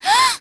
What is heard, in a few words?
Tightrope Fall; Lara; Playable Tutorial Level